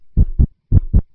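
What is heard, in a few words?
heartbeat; VIDEO GAMES; pulsar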